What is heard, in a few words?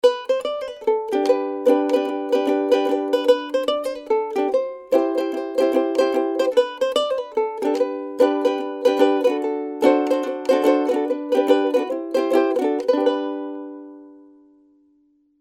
Uke Ditty